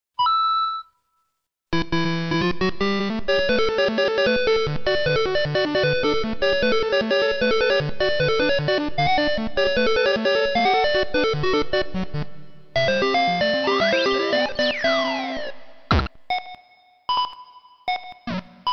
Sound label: techno